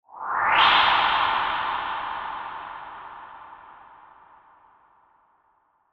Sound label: ghostly ascend